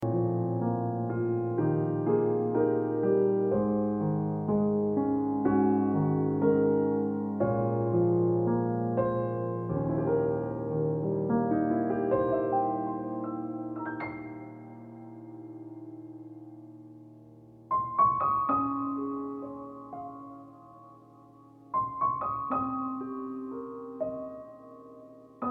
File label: Ringtone Piano Music